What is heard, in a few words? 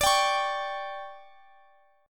D Major 7th Suspended 4th, chord